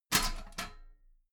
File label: metal ladder small handle unfold regular